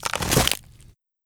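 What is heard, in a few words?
Skeleton Dog Bite Crack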